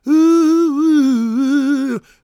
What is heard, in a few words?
GOSP MALE, SOLO; Vocal Planet Vol1 Gospel